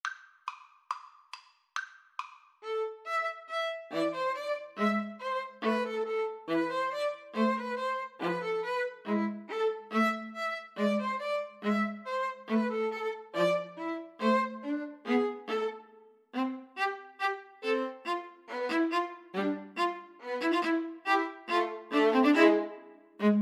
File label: gavotte, String trio